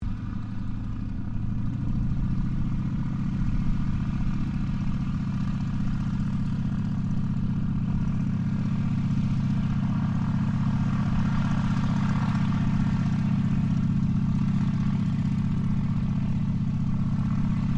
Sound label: Yakovlev Yak-52 system check, Russian Yak 52 Training aircraft; Airplane; Vehicles